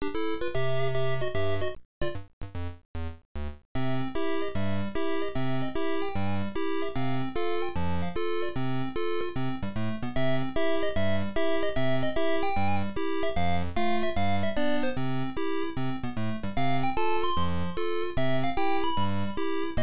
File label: Round BGM; super boy 3; msx